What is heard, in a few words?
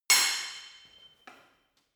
sword hit sword single